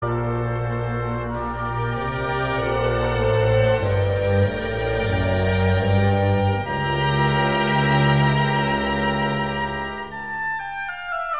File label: Classical Sample